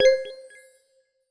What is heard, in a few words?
chip minor